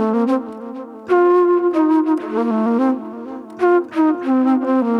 NY 70's Delay Flute; Sonic Foundry (Sony Creative Software); Manhattan Flutes; Session 01 - Benedetta

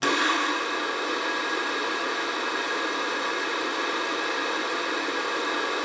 luft, alto sax; Xsample VOL-2

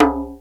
ETHNO DR, PERCUSSION; Crypton - DrumBase5000

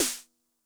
SNARE DRUM High Tune High Tone, 909; classic machines; 909mod

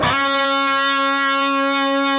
PRS D, Guitars, LEAD

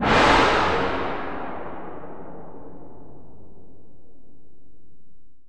SHEET GONG; Rarefaction; Poke in The Ear With A Sharp Stick

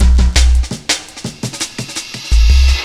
Roll On Roll, Jungle Breaks; M8

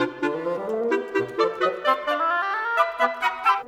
Winds, Orchestral; Sonic Foundry (Sony Creative Software); Orchestral VOL-2; Modern 23

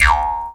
jaw, Didgeridoo and Other Primitive Instruments

